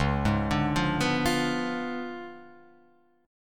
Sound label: C sharp 7th Flat 9th, Guitar, Open F, C#7b9 chord; arpeggiated; JGuitar